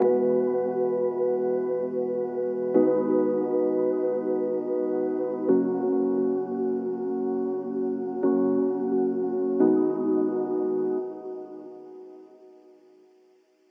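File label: LIQUID DNB SONGSTARTER E 175 CHORDS; KULTURE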